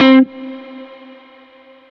Guitar Note Short C4; Aubit; Awake Vol. 1 Serum Noises

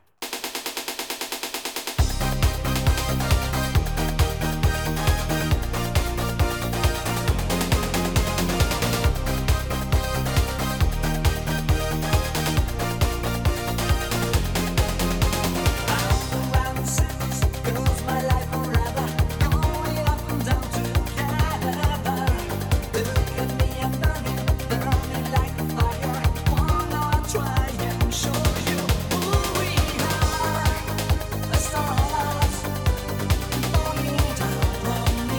With Japanese Voiceover

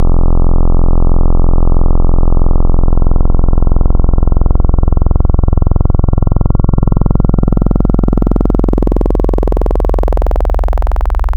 Basics Square Morph; Wavetables; Synthetic's Serum Collection; ESW Core Wavetables; Echo Sound Works Core Tables

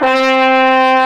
Trombone; Synthetic's Serum Collection; Ronny J Noises

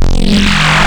Phase Werb, Spectral; Synthetic's Serum Collection

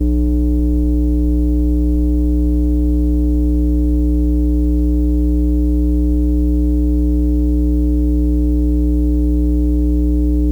Power Box On The Wall For The Outdoor Air Co; Electronics Of My Grandma's House

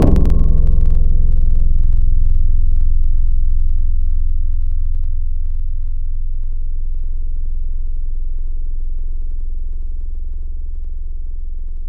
Hit, Tropical Mallets

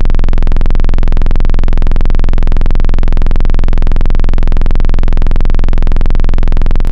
Analog Moog Saw; Synthetic's Serum Collection; ESW Core Wavetables; Echo Sound Works Core Tables

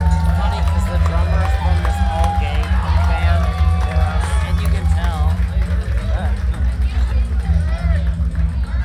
Crowd Cheering And My Dad Talking

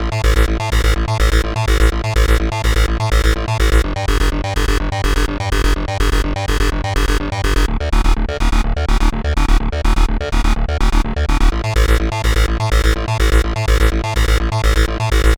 big bad bass 125bpm; lolicore

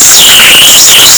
Dubstep; lolicore

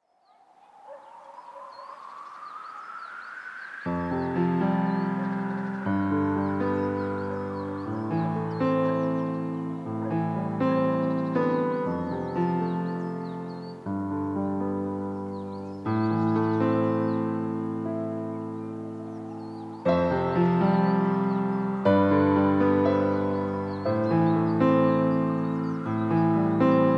piano ambience; universe; lolicore

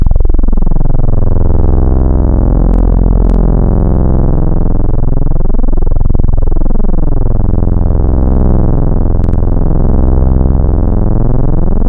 unison; Synthetic's Serum Collection; BVKER - Synthwave

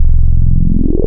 303 Acid; Synthetic's Serum Collection; Cymatics - 303 Acid for Serum Vol 2 Wavetables

